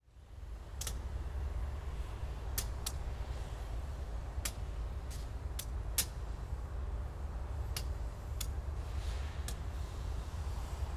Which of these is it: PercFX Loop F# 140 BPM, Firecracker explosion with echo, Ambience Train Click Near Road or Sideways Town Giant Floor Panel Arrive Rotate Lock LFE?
Ambience Train Click Near Road